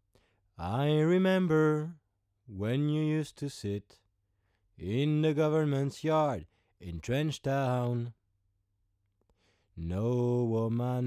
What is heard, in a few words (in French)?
voix c414 preamp bcp de gain avec silk; rupert neve portico